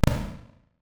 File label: Retro Gun Single Shot